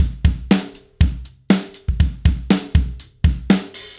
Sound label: Acoustic Kit, Drums & Percussion